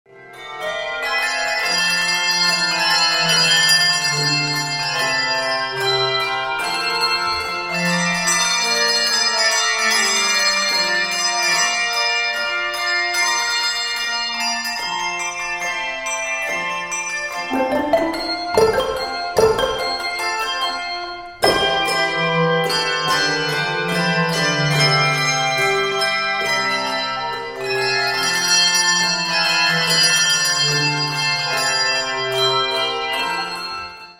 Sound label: ragtime band; folk